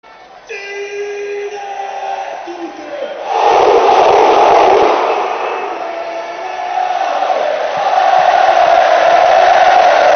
Augh

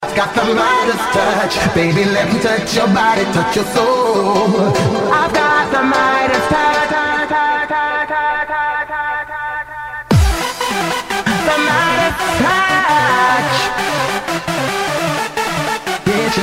Whistle; Midas

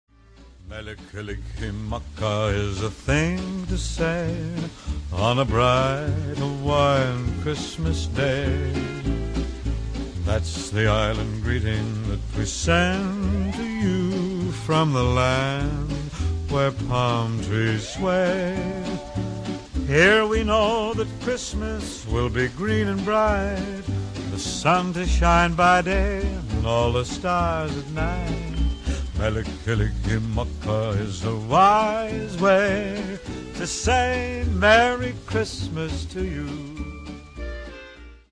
hawaiian christmas song

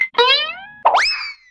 cartoon tone sms; games; ringtones

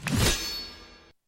Menu Sword

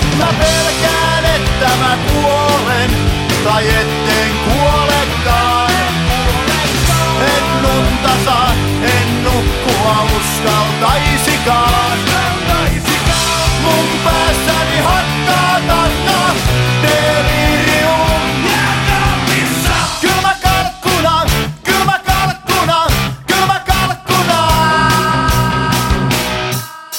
Tango

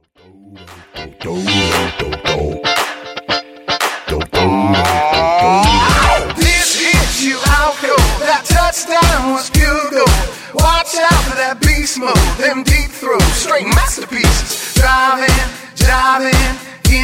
Funk

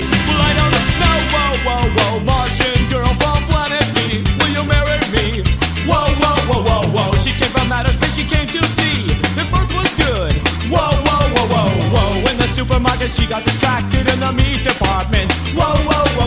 Beats

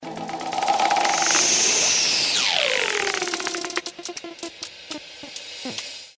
Message tone rolling

